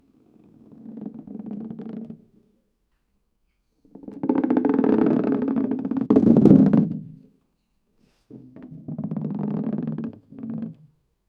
a rolling big ball close

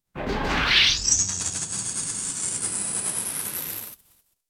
a vintage film style tran; 1970s

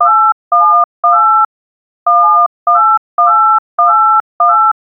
phone call sound

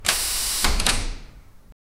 space station airlock doo

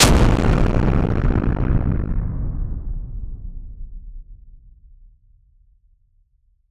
a giant monster hitting; aftermath

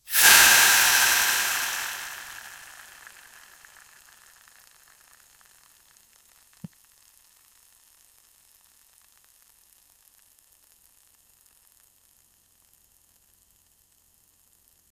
stirring slimy batter; kitchen

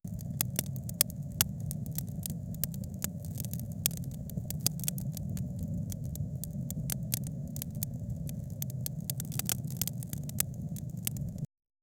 the crackling sound of a campfire at night

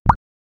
a cartoon style bounce

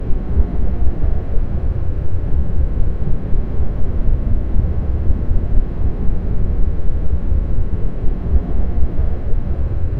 ocean wave; water

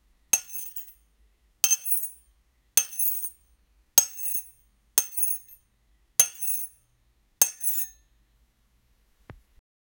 cutting gem with chisel

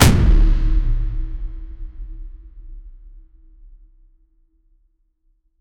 viking hmmmm impact

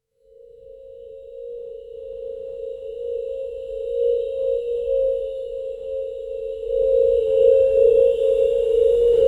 golden energy spiral, shimmering particles

